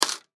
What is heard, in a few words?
Coin Dime Single Drop Drawer; Blastwave; Foley